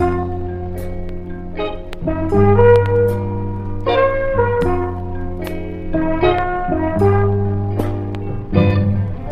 guitar and horns, Loops, Phonky

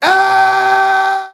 "ahy", Voice

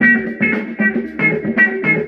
guitar, loops; Phonky

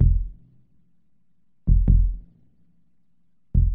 heartbeats; Loops; Electronic

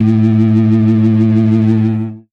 organ buzz; Notes

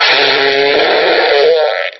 fart medium

